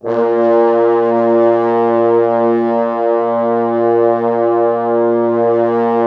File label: Orchestral Brass